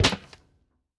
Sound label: Footstep Metal; Opsive; UltimateCharacterController; Footsteps